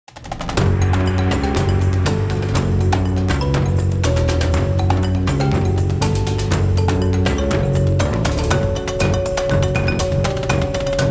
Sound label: Bass and Percussion; Dungeons & Dragons